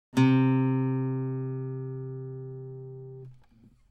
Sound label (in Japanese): 撥弦; 弦鳴楽器; C3